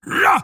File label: Grunt Attack; Androxus; Paladins